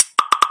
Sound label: slack new message sound